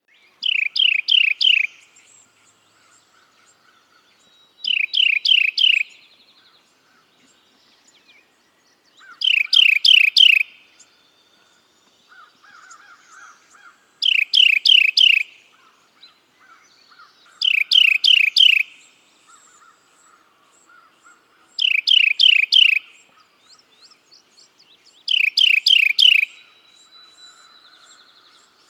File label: carolina wren